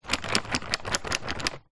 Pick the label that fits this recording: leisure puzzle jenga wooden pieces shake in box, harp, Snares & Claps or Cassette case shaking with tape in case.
leisure puzzle jenga wooden pieces shake in box